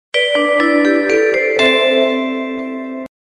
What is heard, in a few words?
Kuala Lumpur Airport